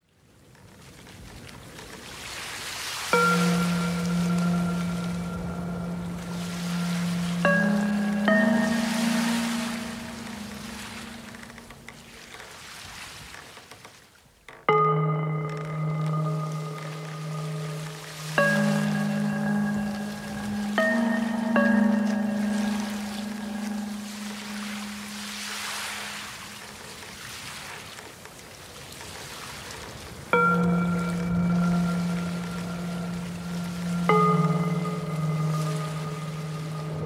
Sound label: waves and wind